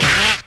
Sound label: slap; Crash Bang Boom